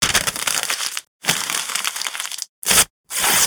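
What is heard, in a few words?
rustling paper; Smeshariki Cartoon Studio; Chelest Bumagi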